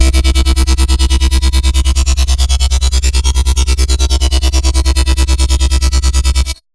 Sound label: Bassline C3 140, Dubstep; GhostHack